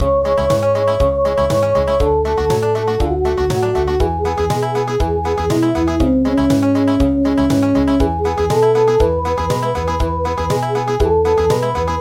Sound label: Country